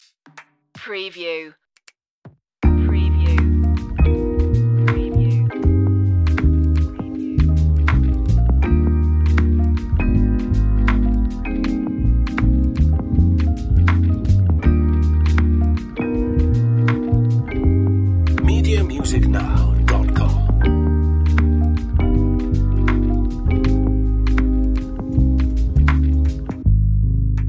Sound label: warm vibe jazzy chill